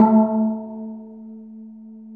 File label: A2 DBL SEC, steel drums; AKAI S6000 CD-ROM; WorldSounds